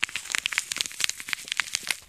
Grapefruit Tear Lng L; gore stabs & eat

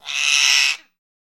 Parrot Squawk Angry

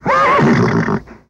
Horse Whinny Neigh

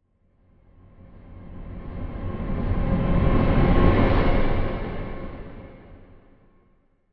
Music Eerie Orchest; orchestral

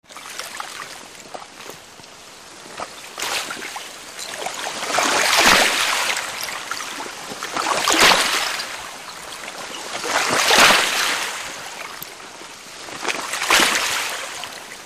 Canoe Paddling Slow, rowboat canoe

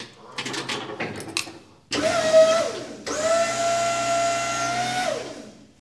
Forklift Elect Mvmt M

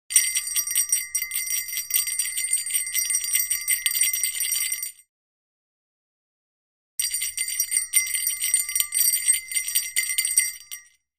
Christmas Bells

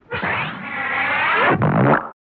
Cartoon Raspberries, vocals

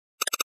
Flash Gear Four Hollo, flash gear

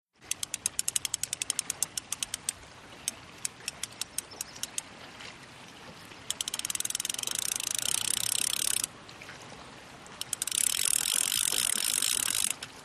Fish Reel From Boat, fishing

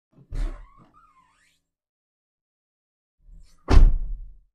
Car Door Chevy Truck, doors

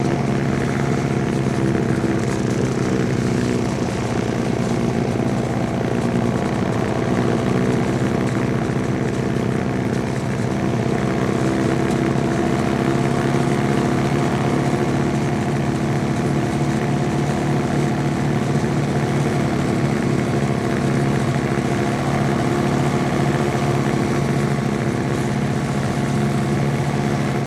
Snowmobile On Board Steady, Looped

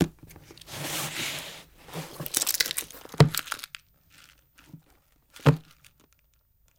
Open Book, books; Crypt Keeper

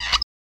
Sml Metal Squeak, screws

